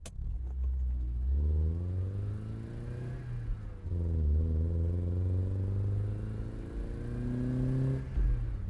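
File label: engine low start; Blind Drive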